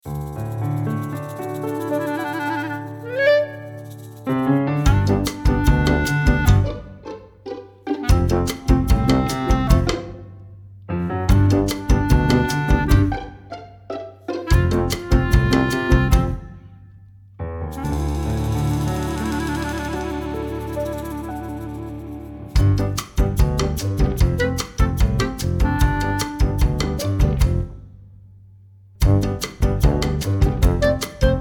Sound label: Bossa; Miami